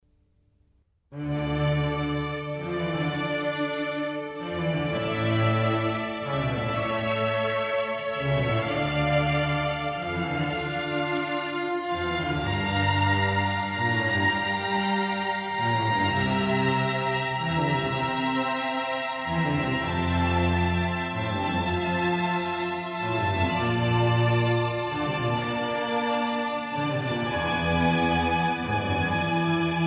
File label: Concerto for Strings in D minor; RV128; Antonio Vivaldi